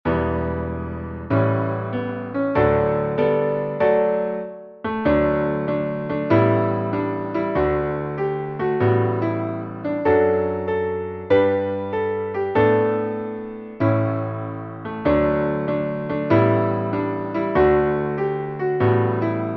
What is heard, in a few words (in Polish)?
Hymn podklad muzyczny